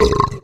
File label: burp; Mid-Simulator